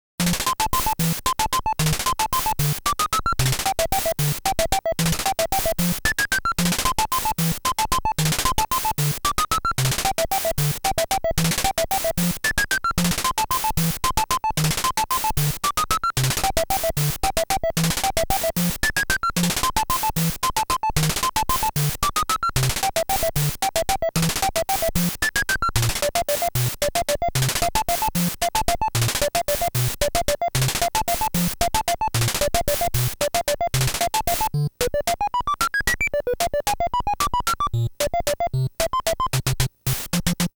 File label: Bonus Stage; Musical Instrument 1~4